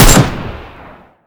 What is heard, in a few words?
AK47 Shoot; Modern Weapons Pack